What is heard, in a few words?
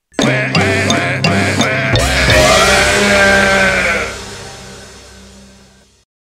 the quack meme